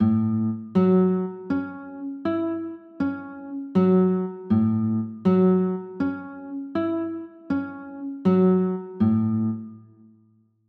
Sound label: a 6, chords